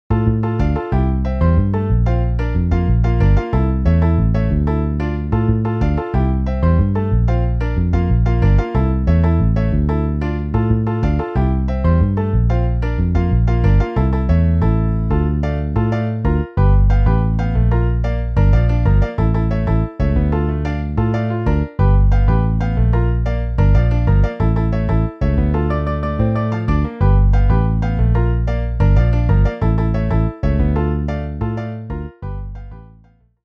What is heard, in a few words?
Piano Bass MIDI